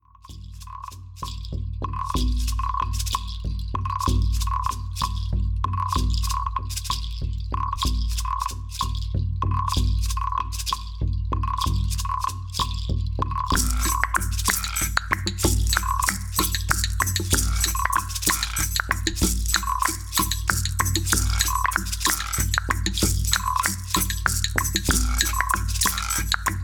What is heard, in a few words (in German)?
Schamanentrommel und Kleinpercussion